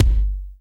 BD, DR BD; Best Service Gigapack 2

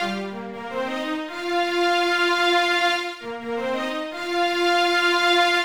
Strings, 70s Analog Funk

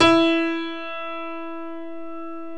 E4 PIANO

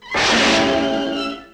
cartoon hit; Greytsounds; CARTOON FX

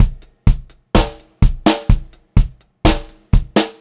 126 POP BT, Pop-Rock Drumloops; 126 POP 4

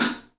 3D Drums, TIGHT ZILDJ; 3D Sound

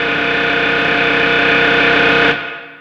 X MACHINES, Production Effects; Best Service - XXL Production Effects

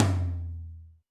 Tom Lo D Amb, Alesis D4

